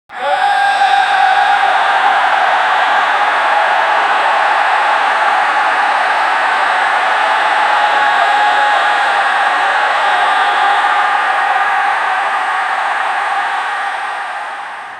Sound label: vlog concert in thailand; location